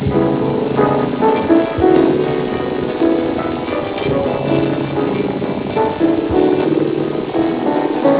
musical

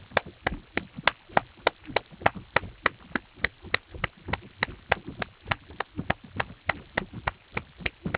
percussion

